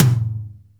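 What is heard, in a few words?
tom, drums; Best Service; Real Mega Drums VOL-1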